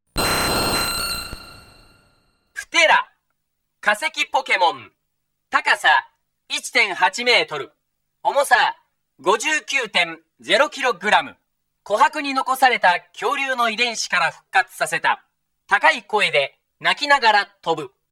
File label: aerodactyl, Pokemon Gameboy Sound; Pokemon Gameboy Sound Collection